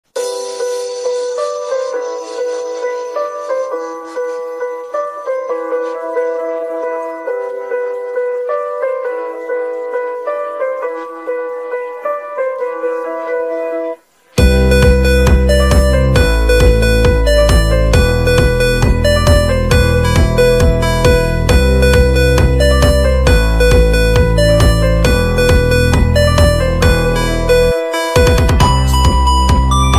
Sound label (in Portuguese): Beat Automotivo